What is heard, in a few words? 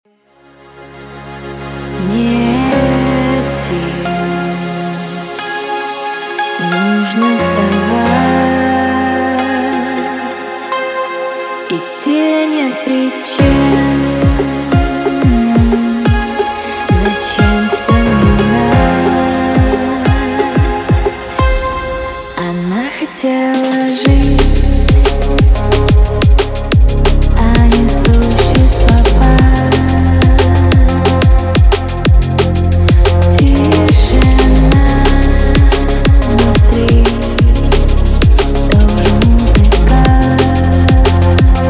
Drum & Bass Remix; Тоже музыка